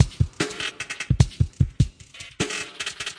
150 bpm glitch my machine; 141 to 160 bpm

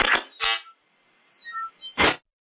radio scanner, radio FM synth type stuff

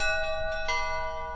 ding dong

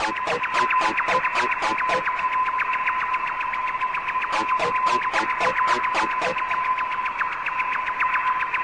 111 ambience and guitar monkeys; SYNTH AND ELECTRONIC LOOPS

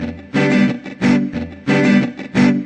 guitar chops; 180; guitar loops; chilla

